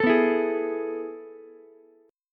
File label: A Major 7th Suspended 2nd, Ukulele; AM7sus2 strummed; JGuitar